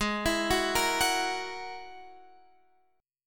G sharp 7th Suspended 2nd Sharp 5th, Guitar, Standard; arpeggiated; chord; x,11,14,11,11,14